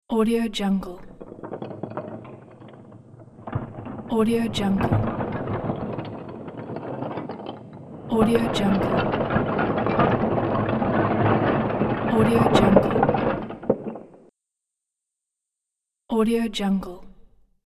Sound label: building wall collapse debris; industrial sounds